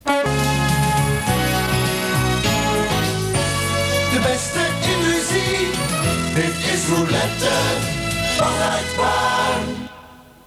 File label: silence